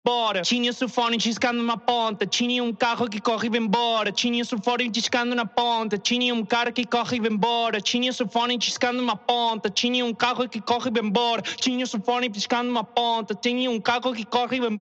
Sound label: vocal